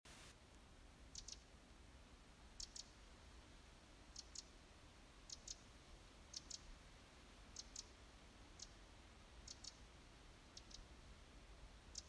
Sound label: Troglodytes troglodytes; SETE